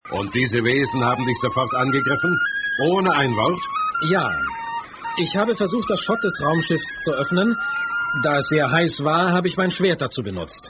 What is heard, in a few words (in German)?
floete